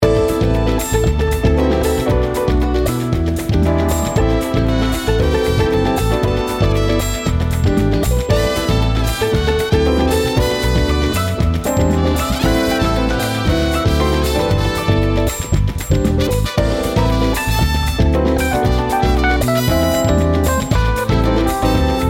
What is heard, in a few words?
latin jazz